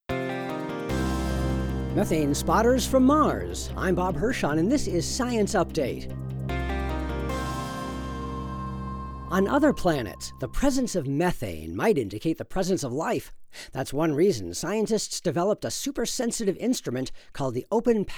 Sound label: drone